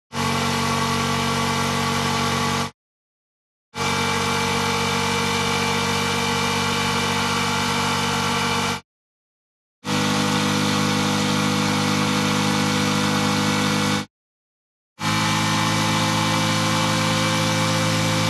TRANSPORTATION MOTORCYCLE Engine High Constant RPM Ascending